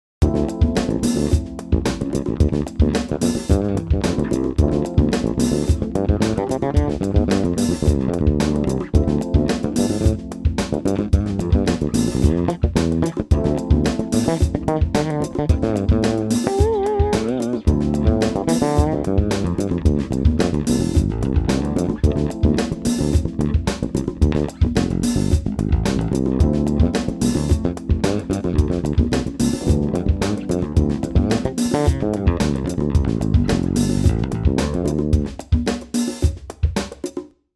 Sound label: Fretless Fingerstyle Bridge Position